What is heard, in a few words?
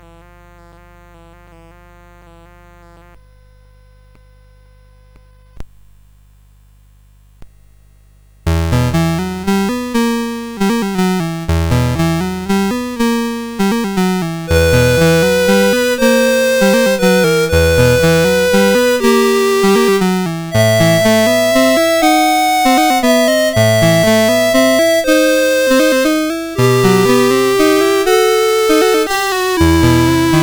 CSG8580R5